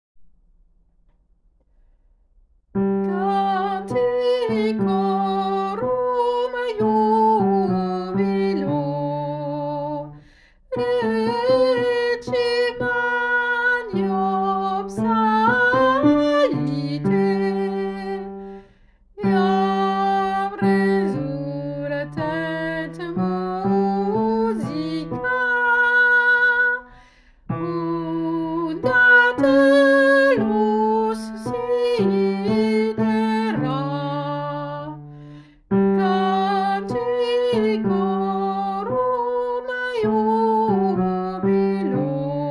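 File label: bass, vocal; CANTICORUM; Montacanto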